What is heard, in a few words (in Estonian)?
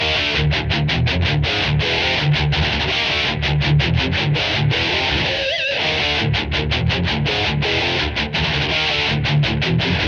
kitarr, no drum; Kidrakäik − nodrum loop